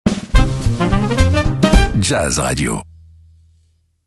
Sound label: jingle